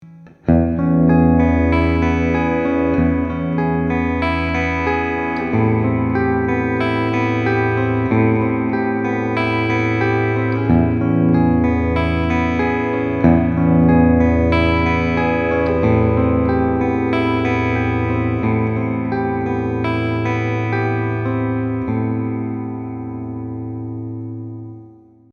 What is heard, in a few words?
ibanez tm1803m talman prestige clean picking bridge